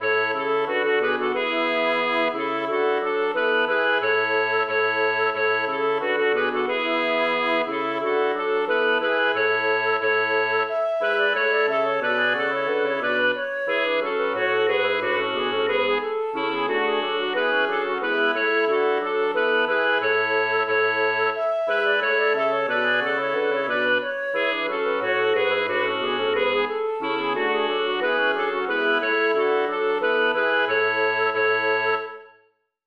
Chorklang; branle del official

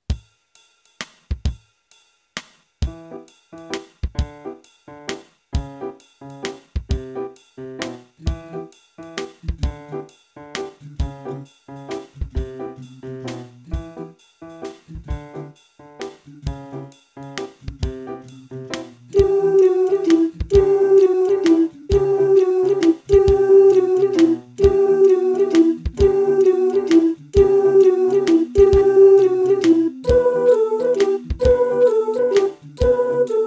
Carol of the Bells Sopran

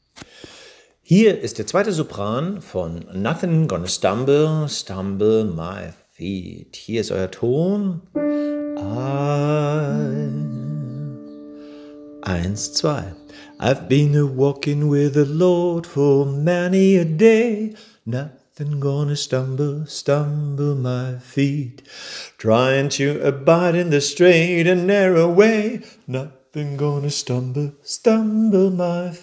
Sopran 2

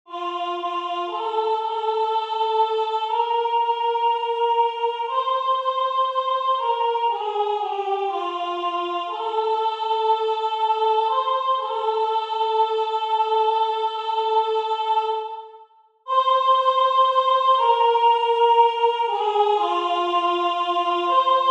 Sopran

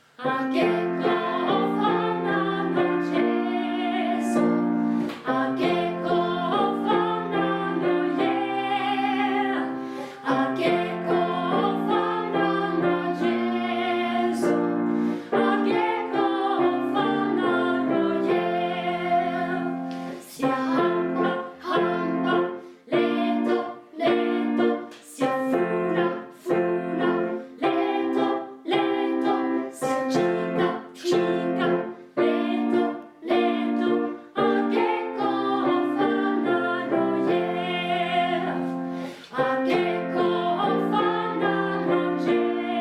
sopran; Akekho ofana no Jesu

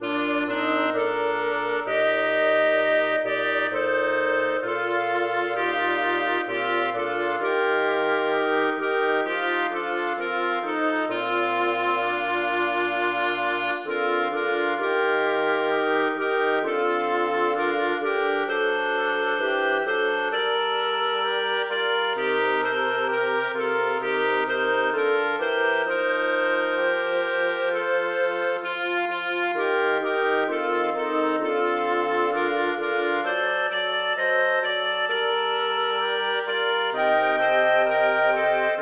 chorklang; An Irish Blessing

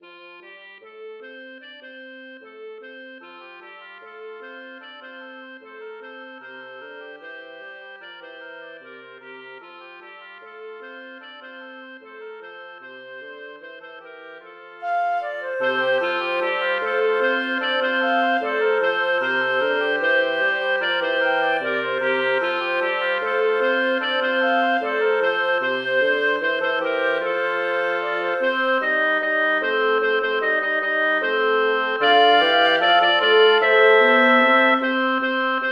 Chorklang; Rocka my Soul

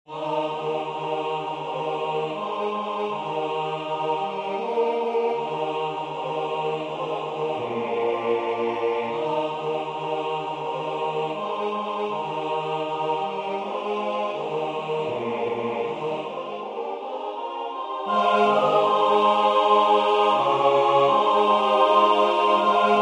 Chorklang

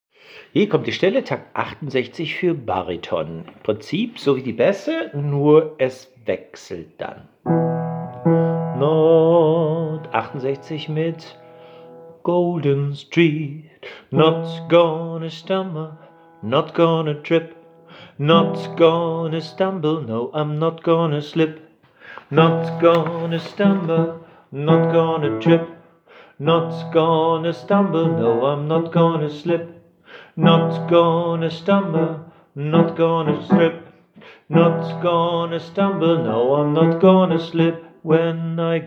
Bariton

